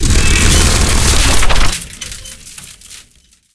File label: prop dead